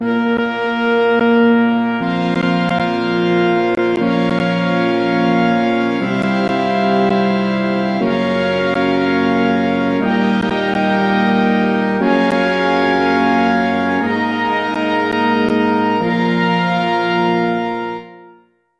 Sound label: Tuning Sequence